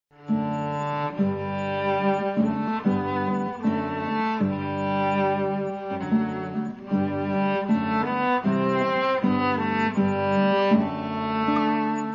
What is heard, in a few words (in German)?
cello gitarre; kinderlieder; die blumelein sie schlafen